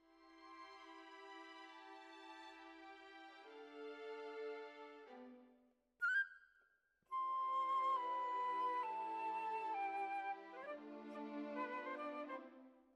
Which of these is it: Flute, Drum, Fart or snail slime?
Flute